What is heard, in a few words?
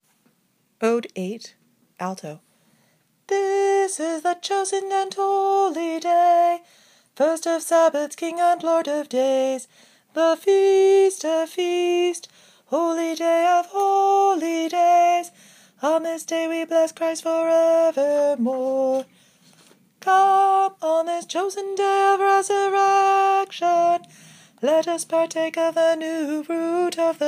paschal canon ode alto